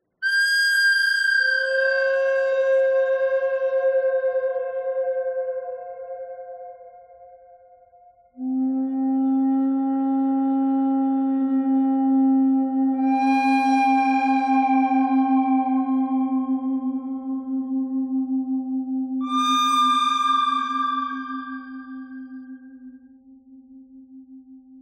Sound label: bird thread pentatonic; noreverb